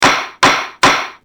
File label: gavel